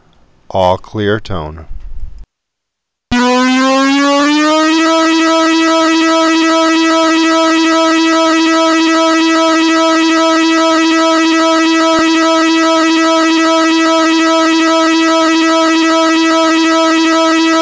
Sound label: All Clear